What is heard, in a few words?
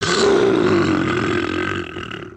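Sabertooth Howl; creatures; pets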